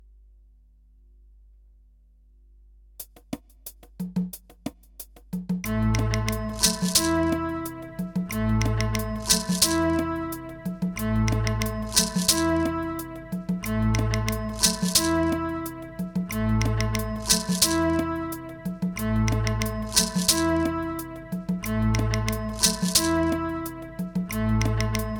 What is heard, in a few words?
backing track